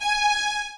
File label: G#5